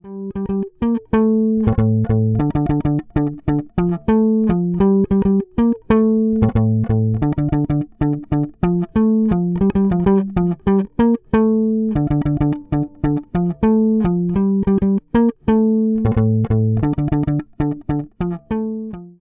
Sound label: Fender 1965 Precision Bass Tone